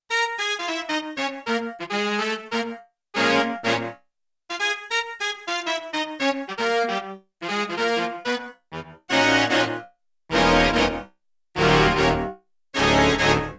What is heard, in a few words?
Roland D-70 Sax Octave; Factory Performances